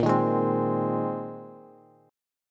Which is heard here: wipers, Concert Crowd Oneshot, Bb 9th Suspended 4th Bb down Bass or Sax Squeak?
Bb 9th Suspended 4th Bb down Bass